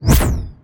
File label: activate; smite; chaac